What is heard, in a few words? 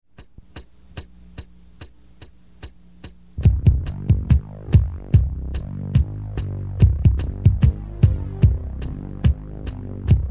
Ambient Rhythm